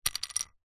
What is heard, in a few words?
leisure poker dealer button chip drop hard surface; zapsplat